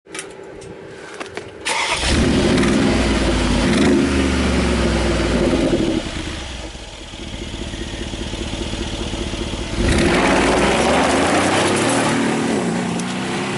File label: G Class exhaust